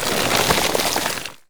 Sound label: frost; Wrecked